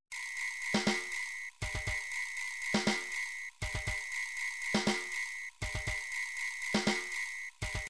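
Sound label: drums pattern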